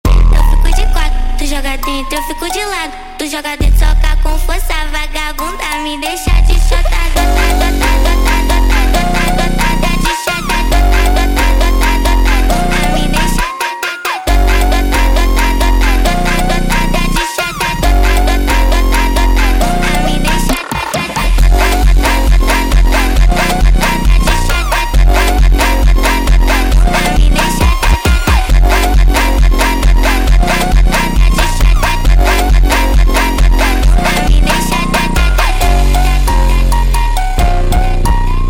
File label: speed Up